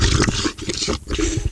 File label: Slime Death; Icebreaker